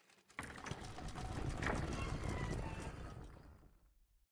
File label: stone gate open